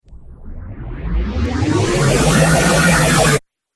Riser Abrupt Stop Phaser; Rise And Dive

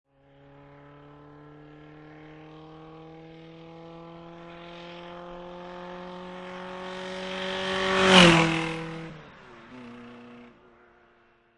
Car Motor Drive By; Cars; Vehicles; Lynne Music

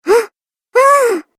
Cartoon Game Voice Shocked Sounds

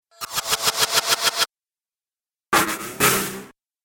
Dubstep Scifi Effects

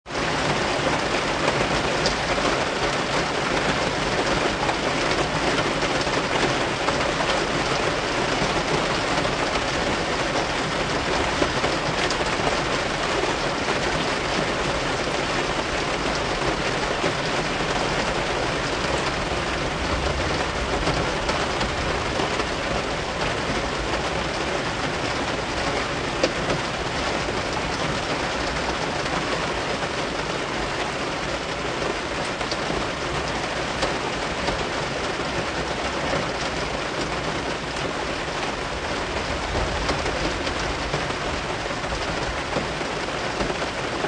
Rain Car, Rain beating on car, windshield wipers; Car in Rainstorm 2; Weather

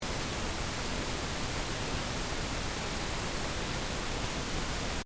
Noise Pink; Reference tones that can be used to test hearing and callorbrate equipment; Beeps; Multimedia

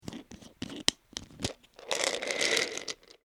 Unscrewing Bottle Top And Emptying Tablets; Twist Sound Effects

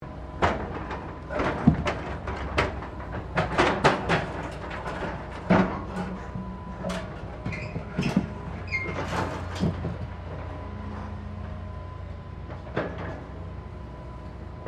Construction mechanical claw movement; Various construction machinery; SoundEffects Foundation2

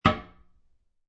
Toilet Seat Close, Household, Toilet Seat Close; Bathroom

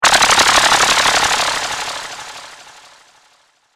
Insectoids Laser Mandibles, sci fi; science fiction sound effect

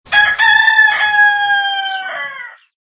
Rooster crow, Classic rooster call sound; mono; Birds; Animals; LynneMusic

